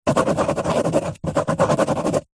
office pen ballpoint paper hollow table write scribble; zapsplat